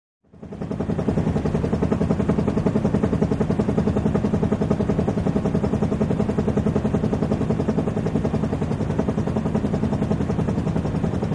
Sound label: Helicopter Hover Huey